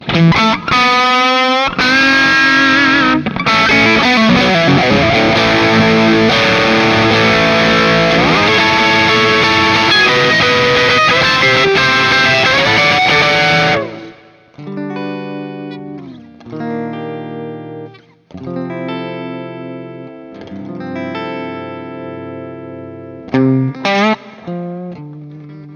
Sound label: Plexi; Kemper profile; classic full blown Marshall® sound; 4x12" V30 cabinet; Kemper profile pack